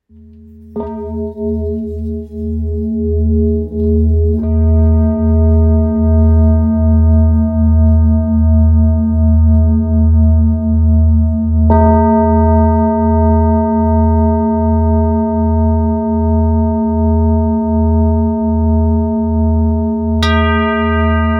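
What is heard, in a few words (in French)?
Bol ramgandi mi, bol chantant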